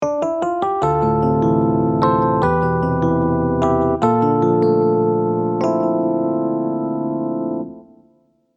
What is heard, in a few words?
jingle